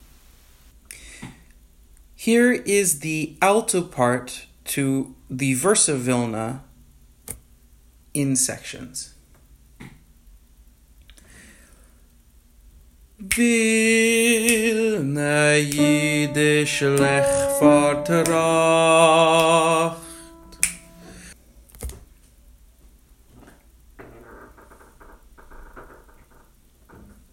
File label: Alto Part, chorus; Vilne